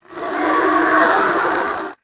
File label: fast metallic pulley sliding on cable; foley